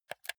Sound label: console controller r1 press